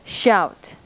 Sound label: vowel shout